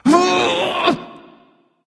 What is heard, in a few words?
grunt lift; smite; terra